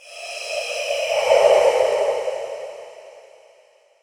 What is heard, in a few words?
Ghostly Ambient Voice